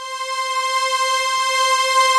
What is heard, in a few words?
SOLINA, ARP, OLD SYNTHS; Rubber Chicken Software Sample Bank